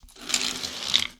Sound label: coins pickup